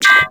Electric Steel

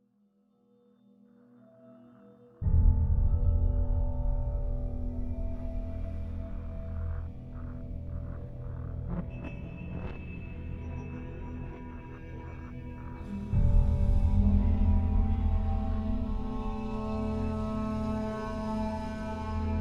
Option Loop

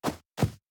Birds Footsteps Loop; Josh Journey Darkness Totems; PS4, Switch, Windows, Xbox One gamerip

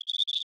Cicada 3 Singles

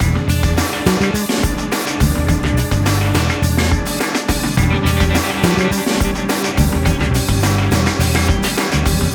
Menu Music Loop; Speedboat Racing

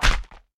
Obstacle Donutbox; In Obstacle Donutbox

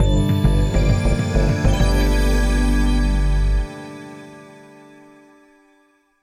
Crystal Quest End Victory; Dungeon of the Endless; DotE Crystal Quest End Victory

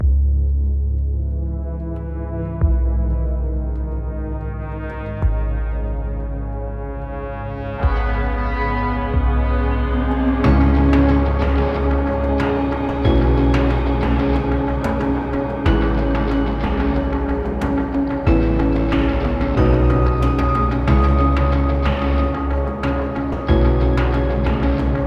End Loop; Starbites

